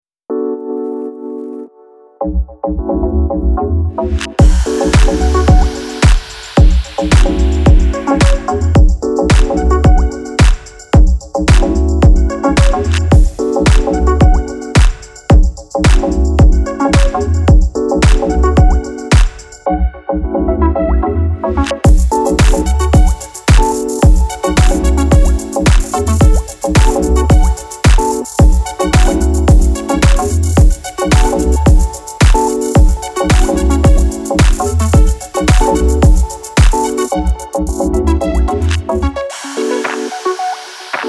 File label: Infographics background short